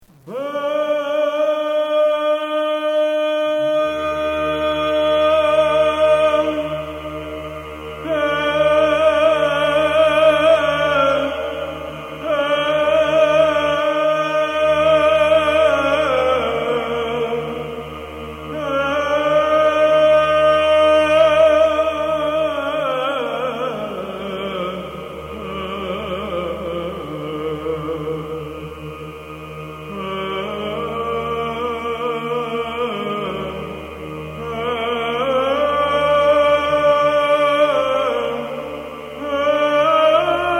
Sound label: organum